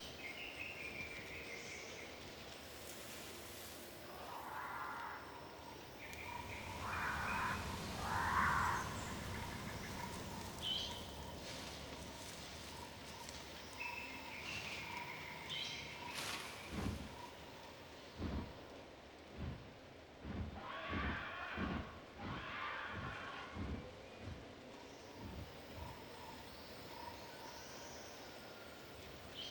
dragon amb; tft; nomsy

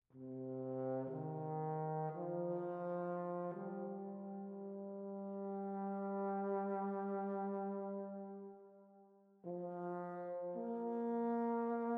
ambient music

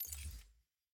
browse enlarge click; lol

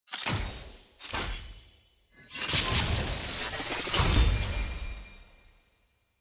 loot hextech egg open mythic

